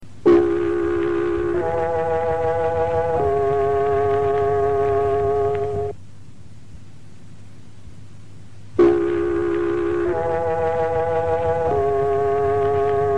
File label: Gong; Radio Mineria